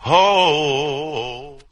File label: "ho" SUNG; Voice; ooh ahh